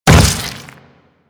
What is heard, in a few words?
Step Flesh, Footsteps; Enemies; Warframe; Grineer